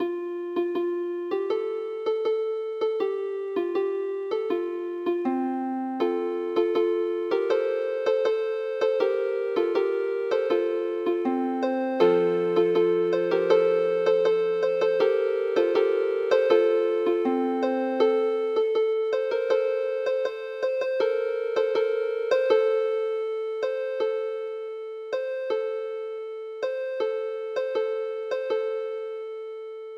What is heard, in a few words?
canon; At a Quiet Lakeside